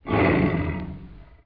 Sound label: dragon; DarkDemo